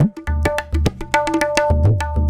Tabla; Sonic Foundry (Sony Creative Software); World Pop

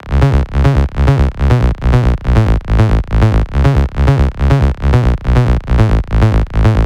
140BPM C#; Vengeance - Essential Clubsounds Vol.4

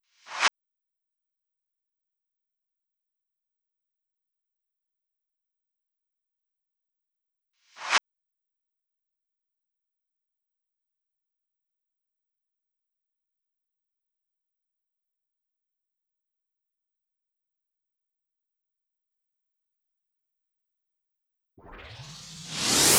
Drum Loop FX Reverse, 128BPM; VEC5; Drumloops; Multi Loops

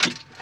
on off, Switch FX; Masterbits

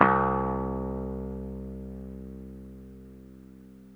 BASS, C2; Zero-G; Chemical Beats; BASSES

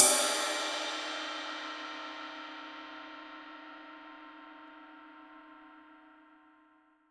CYM, Real Drum Kit, FUNK KIT

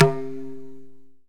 TA, Tabla; Mango Loops

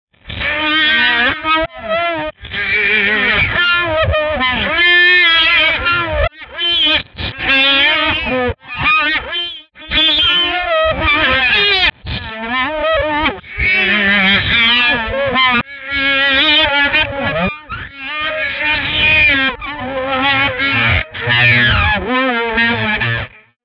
distorto; transmissions

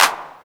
CP, CLAPS, House Side Of 2 Step; USB Soundscan vol.51; AKAI

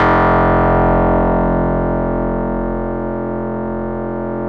RESO METAL 2 F1, Yamaha VP-1; Music Store

